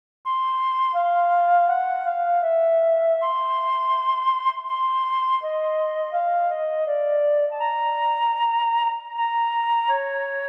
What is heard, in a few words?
Kazabue reverberating with loneliness Solo